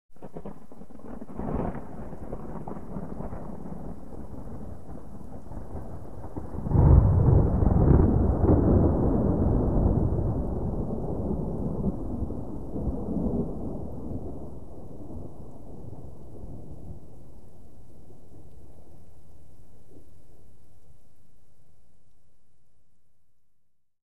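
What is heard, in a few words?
Thunder Close Crack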